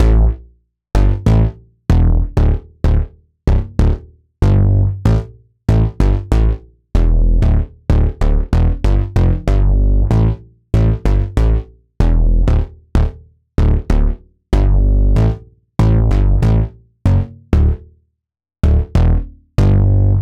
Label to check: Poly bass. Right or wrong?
Right